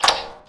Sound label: tank zoom